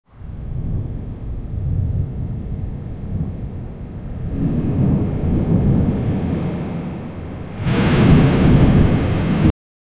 strong wind gust distant; cloth

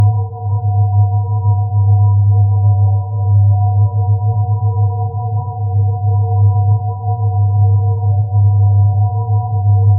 minimal ambient soundscap; minimalist

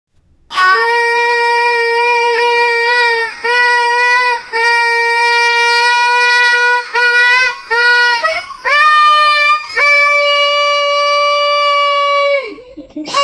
dramatic dolly, cinematic

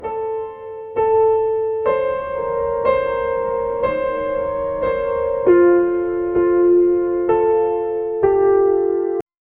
a soft melancholic piano, out-of-tune

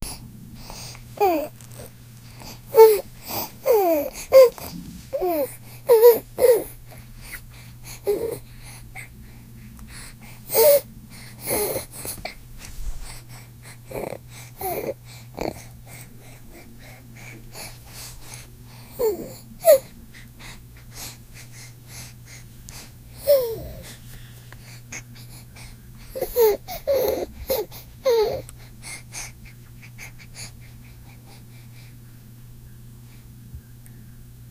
baby lazy sneeze; adorable

